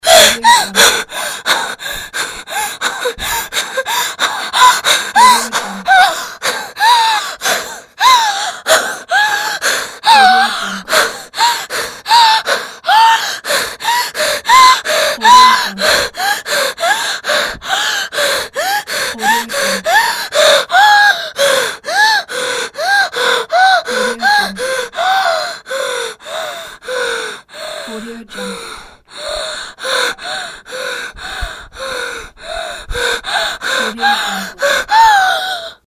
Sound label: female scared breathing loop